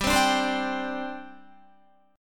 G Suspended 4th, Guitar; Gsus4 strummed; 14,15,14,17,14,15

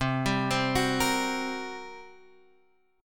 G Minor Major 7th Double Flat 5th, C

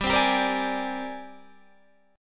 Ab Major Sharp 11th G

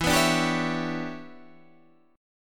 E Minor 13th guitar chord, Half Step Down

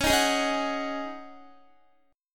Db Suspended 2nd Suspended 4th Db Guitar; strummed; x,16,13,13,15,13

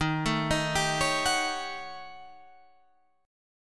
Eb 7th Sharp 9th Flat 5th Eb, Guitar Standard; arpeggiated; chord

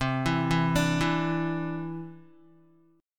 C Major Add 9th, Guitar, Cadd9 chord; arpeggiated; JGuitar

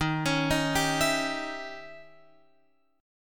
D sharp 7th Flat 9th Guitar Open F; D#7b9; arpeggiated

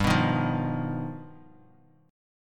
G Augmented 7th, Bass Standard; G+7 strummed; JGuitar

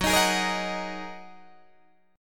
Gb 6th Add 9th Gb down Guitar Open D

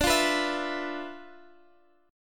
D-Minor 6th Add 9th, D, Guitar, Open F; x,x,14,12,14,11; Dm6add9 strummed

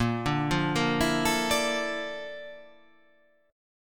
A sharp Major Sharp 11th

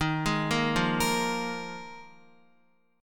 Eb Suspended 2nd Suspended 4th, Guitar; Ebsus2sus4 arpeggiated; JGuitar

